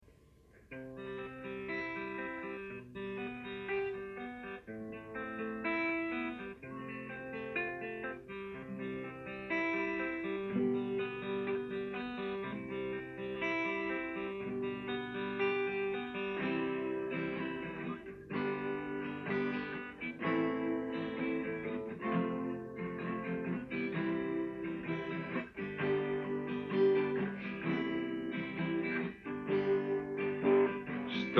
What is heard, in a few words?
unplugged